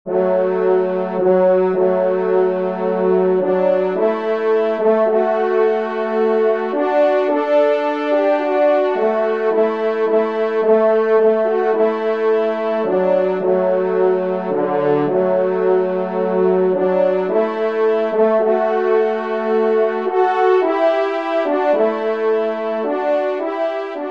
Valse, trompe; Loisirs du Chasseur; Henri Sénée

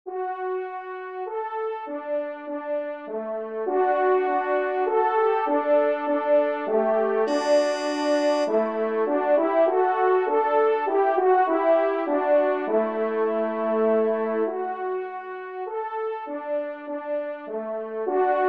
Menuet Do Maj TRP; Corrette

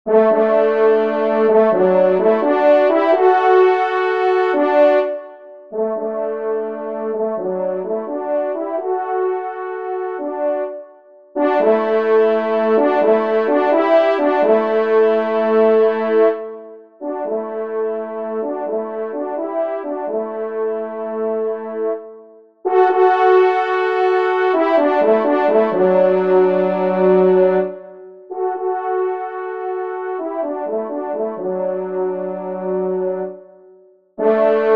Valse des Chasseurs, trompe; 2e Trompe; P Bouillon; Auteur : Paul Bouillon

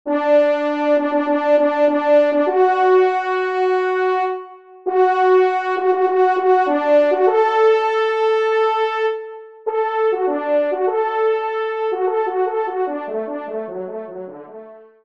Grande Fanfare TRP; 1ère Trompe; Trompes et/ou Cors en Ré; Auteur : Paul Laugé

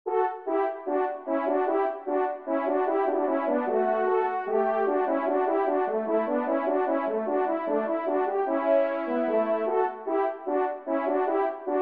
Rondeau Do Maj ENS; J.B. Boismortier

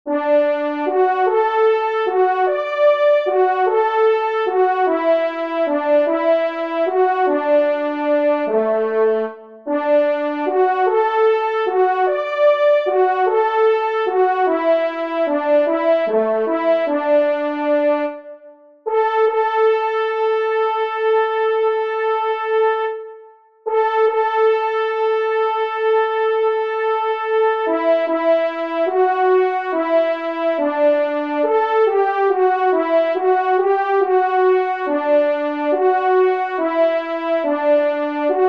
Variation Pour Trois Trompes; 1e Trompe; Gustave Rochard

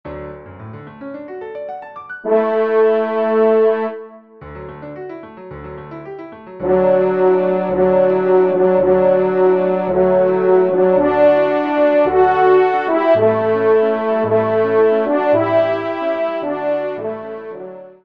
trompe; Introit; Messe d'Estival

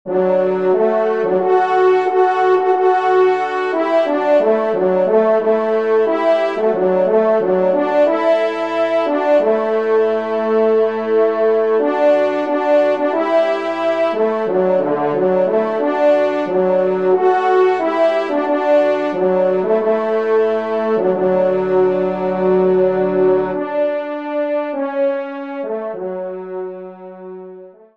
trompe; Offertoire; Messe de Rochard

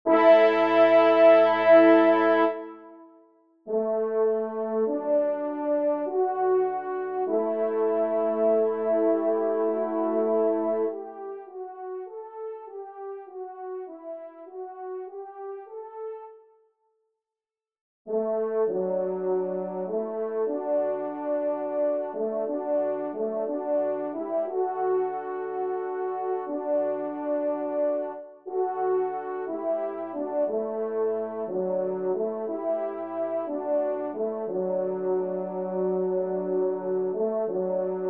trompe; Hymne à L'Aurore; Jules Cantin

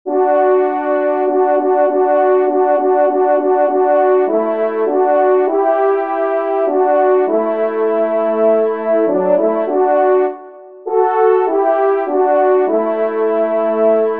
trompe duo; Offertoire; messe d'estival

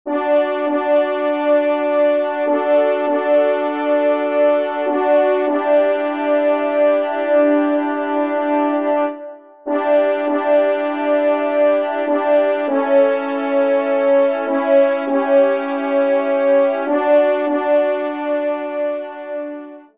Trio Cors

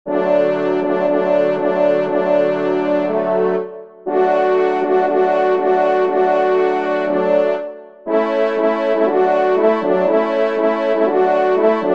marche, ensemble, trompe cors; Divertissement pour Trompes et/ou Cors en Ré; Marche des Cerfs; Auteur : Paul Laugé

